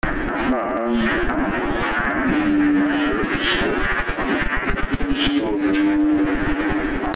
SCIFI

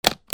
OFFICE

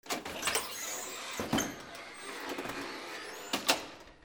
DOORS

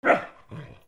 DOGS

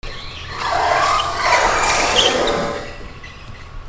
FRICTION

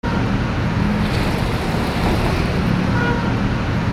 HIGHWAY

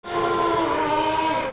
ANIMALS

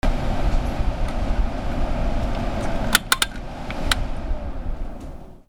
HOUSE

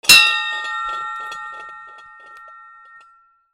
BELLS

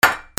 KITCHEN

